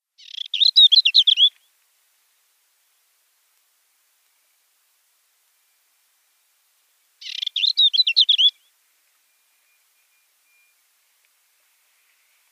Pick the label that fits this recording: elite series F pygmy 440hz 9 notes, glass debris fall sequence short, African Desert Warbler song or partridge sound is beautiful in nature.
African Desert Warbler song